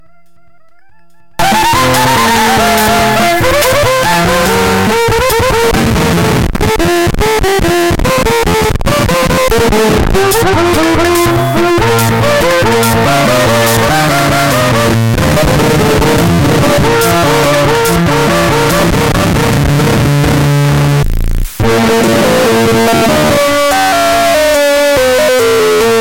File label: Convulsion CN-2 keyboard